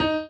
marimba; ゆめ2っき

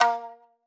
marimba shamisen; ゆめ2っき

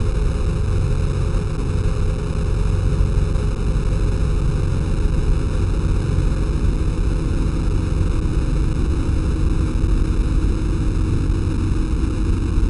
drone slow; ゆめ2っき

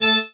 marimba grave; ゆめ2っき

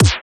slap

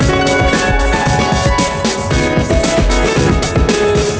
piano; ゆめ2っき

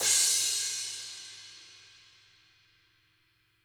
marimba drum; ゆめ2っき

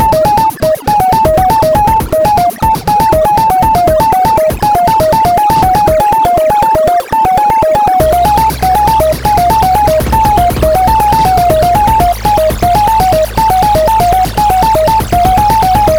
madcomputer; ゆめ2っき

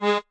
marimba chainsaw

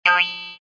ta ta biyoyoyon

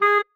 marimba penguin; ゆめ2っき

